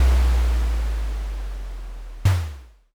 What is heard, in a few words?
castle gate